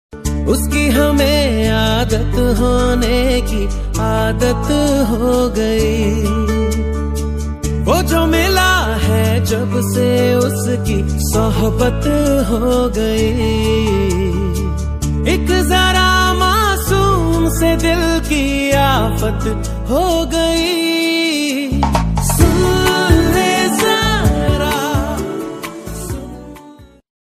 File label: hindi android ringtone